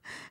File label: woman gasp